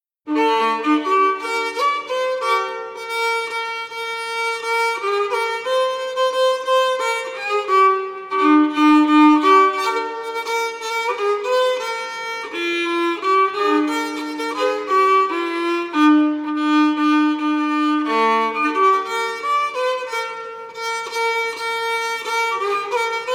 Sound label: fiddle tune